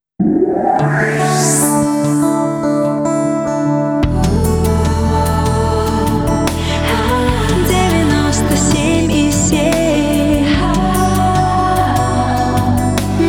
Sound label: radiojingle; ru maestro